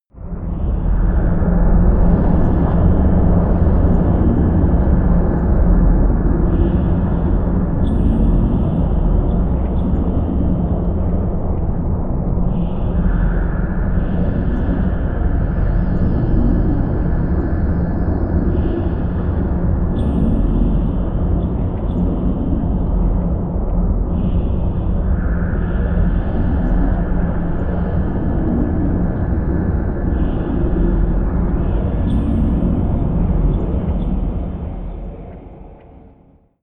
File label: drone haunted